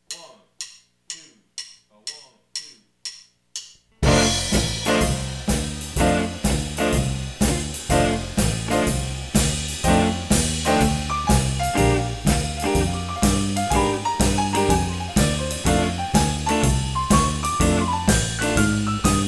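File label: Swing in F, blues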